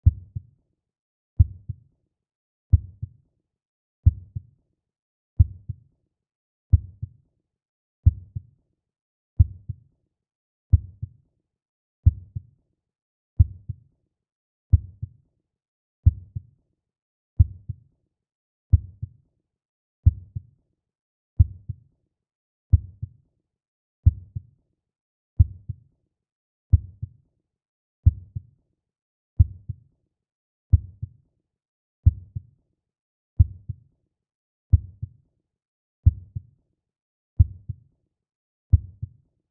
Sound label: heartbeat